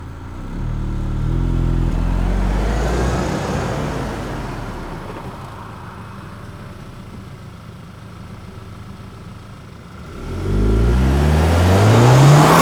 audi a4 allroad 2.0 TFSI exterior reverse and sudden acceleration following; mono NTG3; SoundHolder